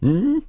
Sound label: Sneer; Barnes sneering at Renado